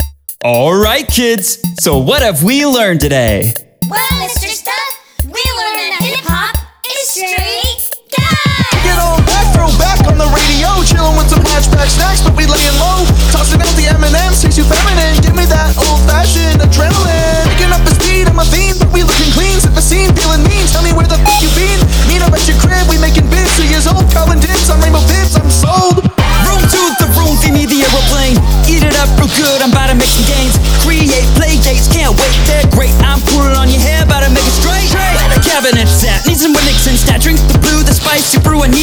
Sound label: Noise